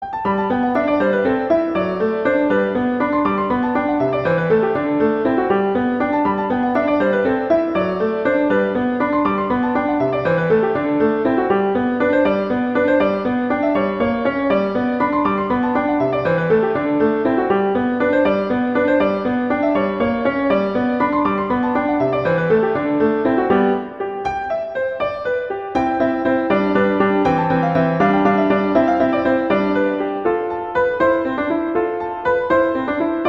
Waltz; 12 Pieces; M Clementi

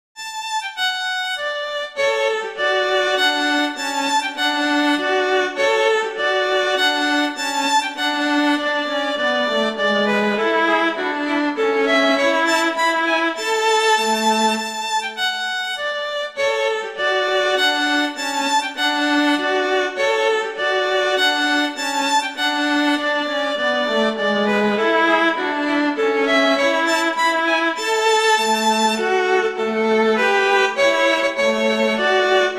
Duet; Mozart; Duets; Vla

